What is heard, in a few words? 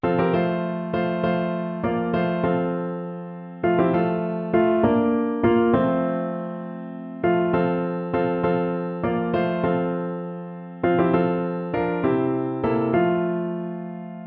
cadences